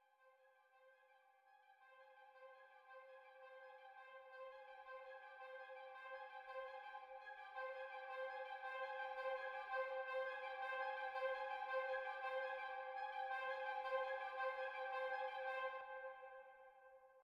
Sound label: music interactive; World of Tanks